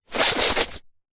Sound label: human sniff snotty into hand fast side to side movements